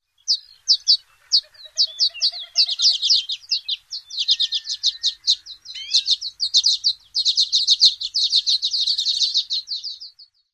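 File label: Hoary Redpoll, calls; AK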